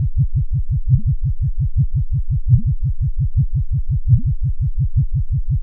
Noise, LFO & Noise

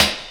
SD HOU, Dance Mega Drums; Best Service

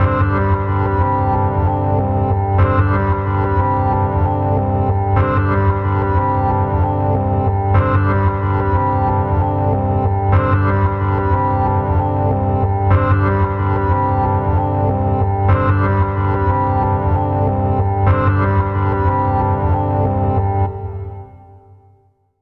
Back Drone, Musical FX; Zero-G Smoke

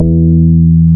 BAS MKS-50, Roland

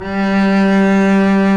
STR, SOLO SECTION; Roland - LA Composer vol. 1

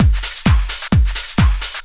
dr t 130 trance 2

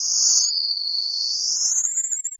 Signal, Textures

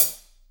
HH hh; Best Service Gigapack 2

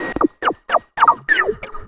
VFX, VOX ROBOT; Best Service ProSamples vol.49 - FX-Area; AKAI

